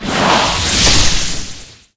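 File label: cast skill frost nova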